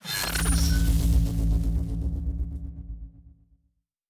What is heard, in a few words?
Shield Device Start, Electric; Sci-Fi Sounds